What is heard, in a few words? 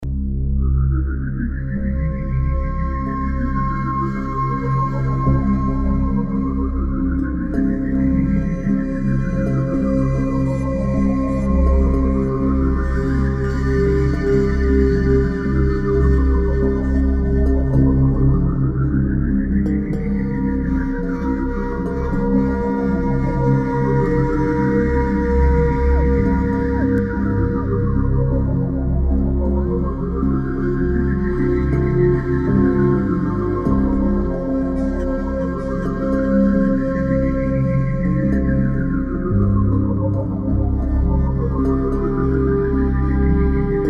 Paradox Drone; Nexus Tower